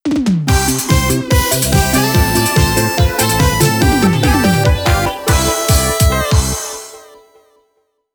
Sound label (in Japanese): ジングル; anoko ha orekara hanare nai original soundtrack